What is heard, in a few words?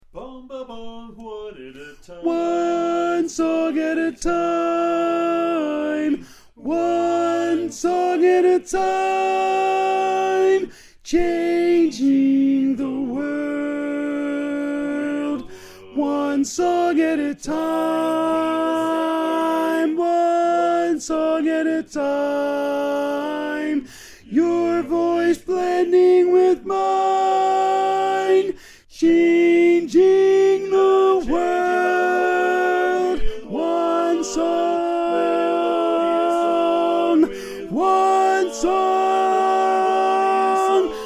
Tenor